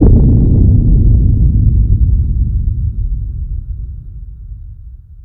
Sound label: player bodyfall; estate; Call of Duty Modern Warfare